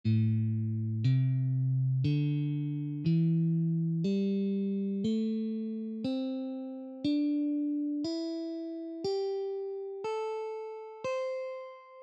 Beginner guitar scales

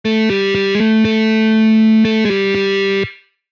Electric guitar techniques

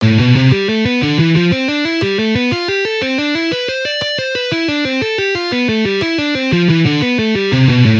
String skipping, guitar

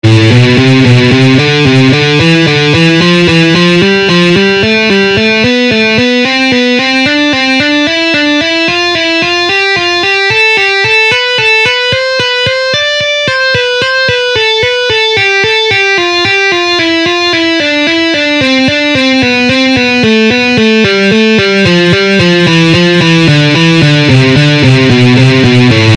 Guitar scale exercises

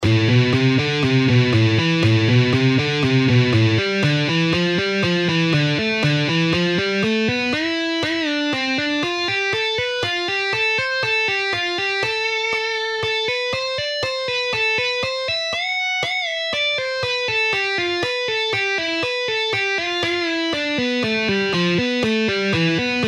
Guitar fretboard exercises

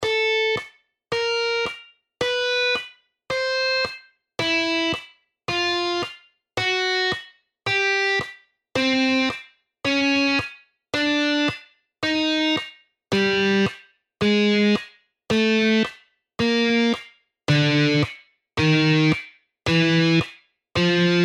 guitar finger exercises

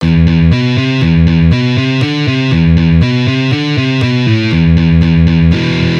Hammer ons, guitar

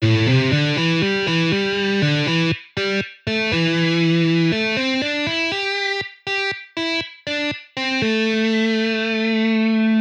Guitar solo

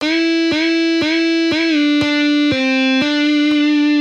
Easy guitar licks

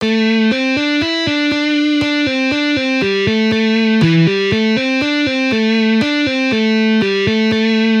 Guitar solos

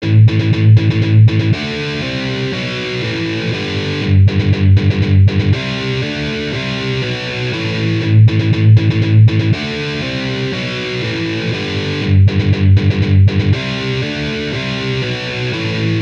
Metal guitar riffs

